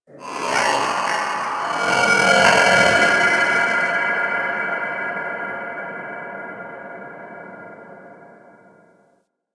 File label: Cephalon Tech Medium, Ambience